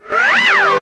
TOON; Zero G; Man Machine

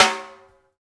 SNR SUN SN, Snares; Roland - Rhythm Section; Snares 6; Sn Modules 6

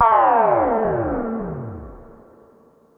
Simple OSC Spring Reverb

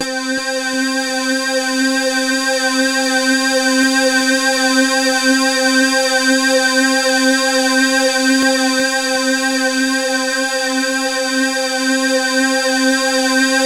C3, Synth Line; 90 s Sample CDs; NiceBeats; SynthLine VOL-1

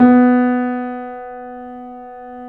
KEY, YC7; Rhythm Section

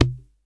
WD L, DRM HITS; Spectrasonics; Liquid Grooves; WAVDRM HITS1

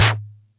Music Percussion Computer Tom, Drum Machines; Atlantex MPC-1

